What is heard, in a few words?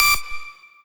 lead